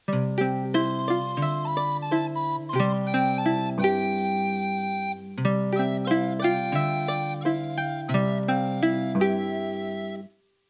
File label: Happy Recorders